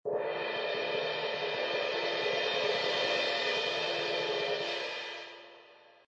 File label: orch cymbal roll, Orchestral Cymbal Roll; Percussion Loops